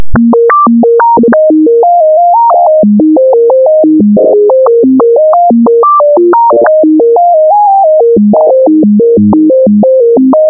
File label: firefly intro synth